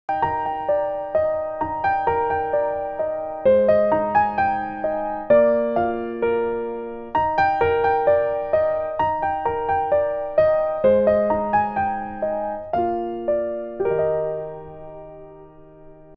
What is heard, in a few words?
Lullaby Piano, ringtones; Motorola Moto-E20; Lenovo